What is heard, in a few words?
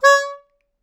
Melodica Short; Short Notes Chromatic